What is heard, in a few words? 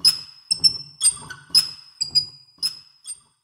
lamp Shot; dead or alive 2